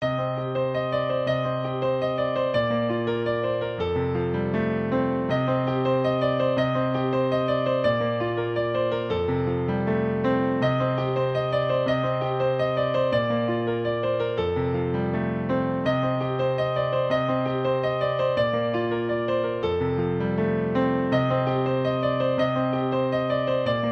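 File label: melody on piano ringtone; melodiya na fortepiano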